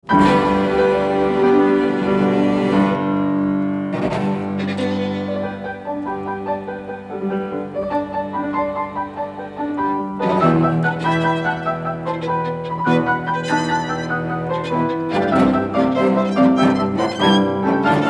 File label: Trio in B Op. 8 II. Scherzo. Allegro molto; Brahms